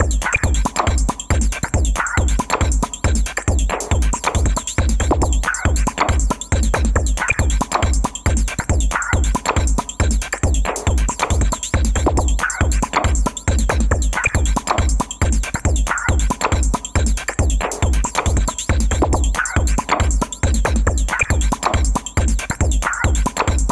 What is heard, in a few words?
BoomBox loop